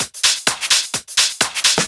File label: Discohouse Loop 128 BPM; Best Service; House Definition VOL-1